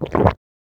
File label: gulp liquid; CosmicRage; general food and drink